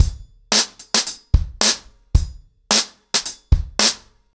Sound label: 110BPM, ACOUSTIC DRUMS; The Roots Drumloops